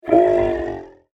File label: Lunar Lizard Call, monster sound effect; science fiction sound effect; Andrew Fleming; Twistedtracks